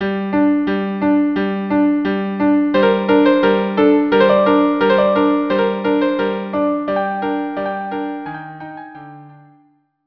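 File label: violin viola duo; Moment Musicaux 3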